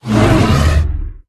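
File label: bear attack middle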